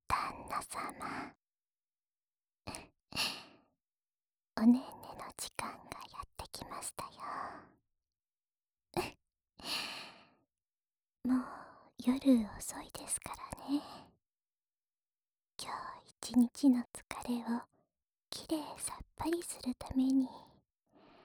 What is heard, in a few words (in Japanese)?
吐息いっぱいの添い寝（右耳）